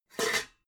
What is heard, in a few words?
pots clank roomy